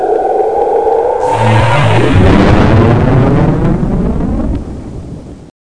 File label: Flange; Hell Cab